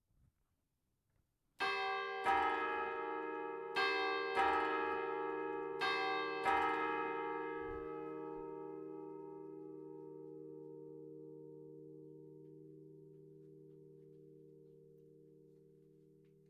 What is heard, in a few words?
Ettiswil 3 quarts, campanologie; Willisau (LU); Assemblée générale 2013 de la GCCS à Willisau (LU)